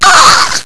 pain; vortex; speedy

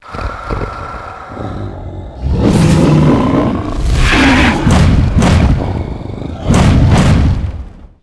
boss idle; lmctf

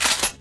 mp5 slide, weapons

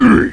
bump

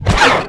fall; minibot

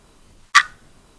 clock click; baseq2; happyfriar

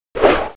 swing, sword

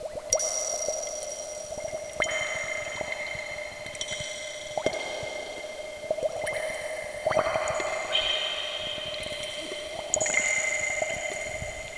drip; baseq2; dod

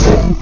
gurp

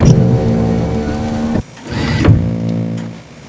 idle, monsters; zaero; bossz

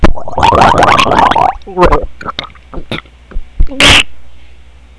DROWN; vortex; shogun

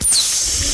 jump; vortex; r2d2

